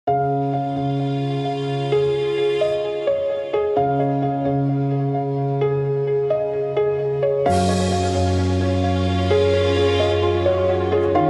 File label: corporate